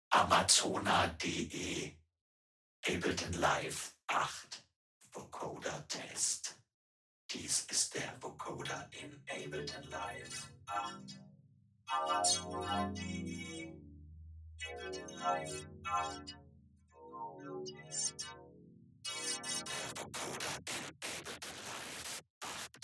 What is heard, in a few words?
Test Vocoder